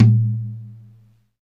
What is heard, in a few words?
low tom A key; tom drum